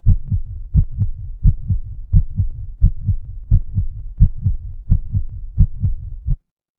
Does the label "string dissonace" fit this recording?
No